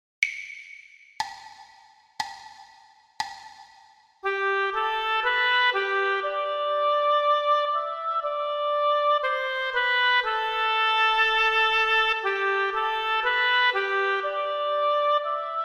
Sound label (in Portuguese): percepcao ditado